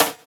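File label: stick, Roland MV8800 drum kit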